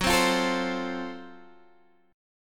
Gb Minor Major 7th Flat 5th, Guitar, Standard, chord; strummed; x,9,10,10,10,8; JGuitar